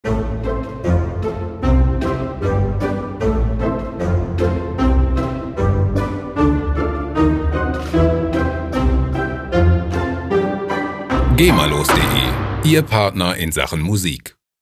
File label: tutti